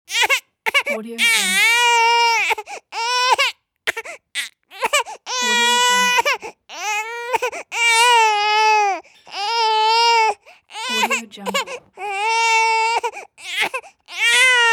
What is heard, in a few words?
baby crying